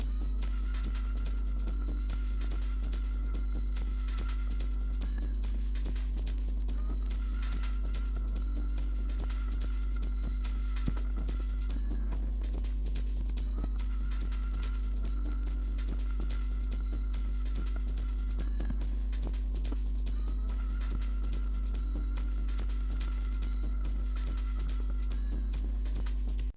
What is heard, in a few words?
rave